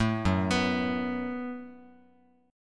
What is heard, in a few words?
F sharp Minor Double Flat 5th F sharp, Guitar; arpeggiated